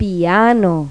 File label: PIANO; Pipo-Aprende musica con Pipo